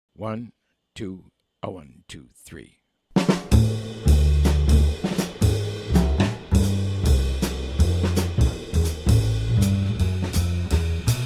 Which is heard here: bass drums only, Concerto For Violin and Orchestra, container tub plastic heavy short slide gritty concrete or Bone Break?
bass drums only